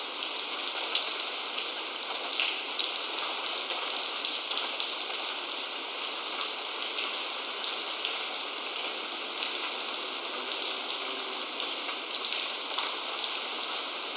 White Noise Drip Drop Rains

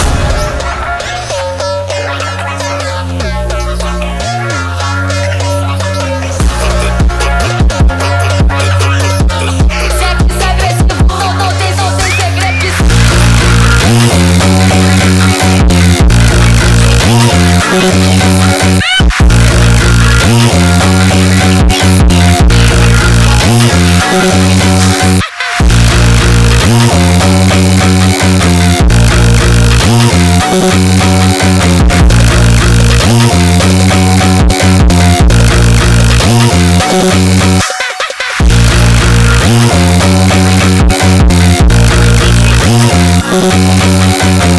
Brazilian Phonk Speed Up

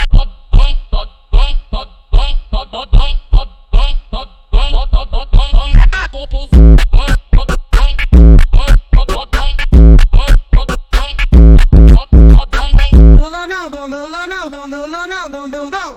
BRAZILIAN PHONK

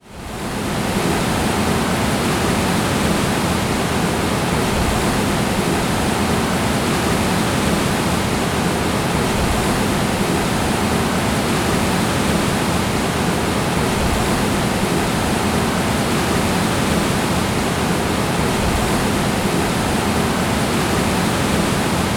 White Noise Park Falls; Outside Broadcast Recordings

